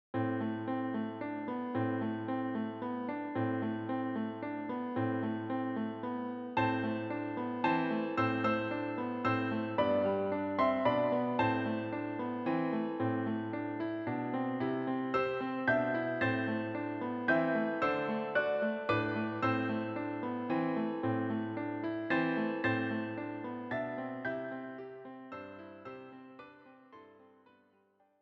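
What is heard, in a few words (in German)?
Scarborough Fair Klavier vierhändig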